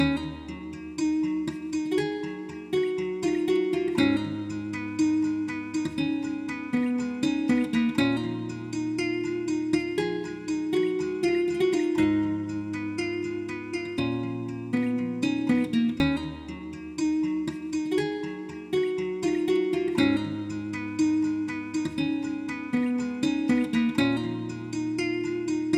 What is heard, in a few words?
Guitar Loop